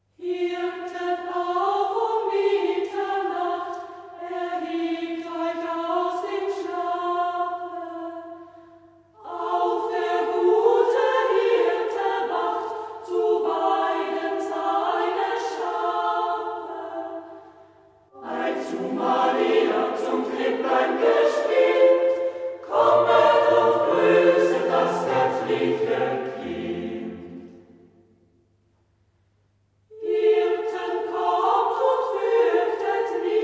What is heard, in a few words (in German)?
chor; Hirten, auf um Mitternacht; Hirtenlied aus Weitra; Chor Haag